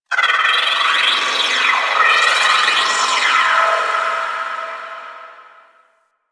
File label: Orphix Shield Pre Disable One Off; Warframe; The New War; Sentient Devourer